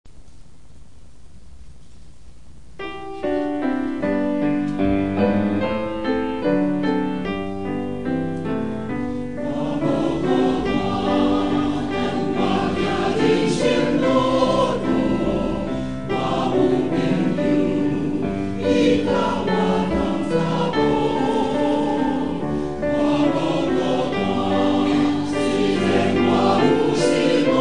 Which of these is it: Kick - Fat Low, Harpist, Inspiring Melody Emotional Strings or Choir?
Choir